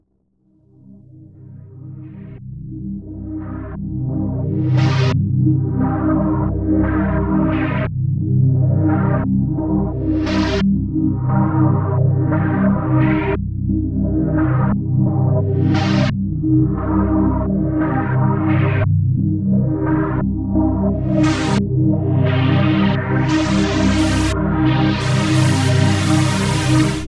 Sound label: PWM Warm String; AMG - Prototype Drum & Bass